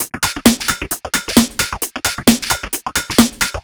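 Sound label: 132 No Bass Drum, Drum Loops; Zero-G; Soundclash Nu Dance Electro; Acidized Wavs; 132bpm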